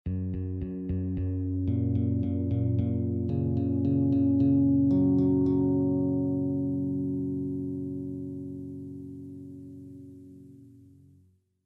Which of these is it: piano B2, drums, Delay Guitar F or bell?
Delay Guitar F